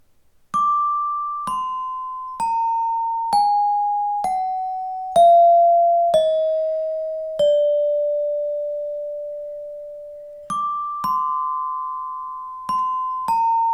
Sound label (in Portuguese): escala harmonicos invertidos